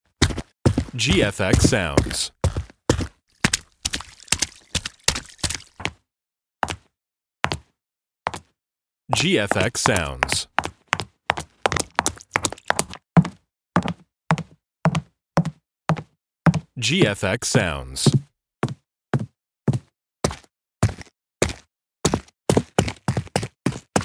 footsteps sfx pack

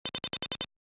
Electronic military device beep; Military Equipment

